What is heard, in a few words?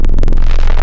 Dist 8bit Fwap; Digital; Synthetic's Serum Collection